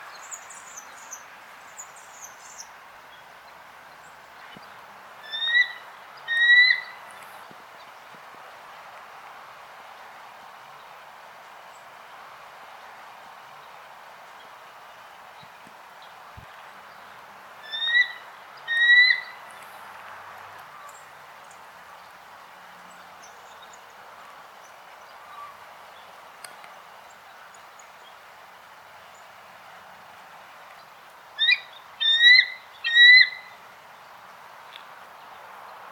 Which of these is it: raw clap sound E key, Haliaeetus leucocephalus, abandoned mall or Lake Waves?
Haliaeetus leucocephalus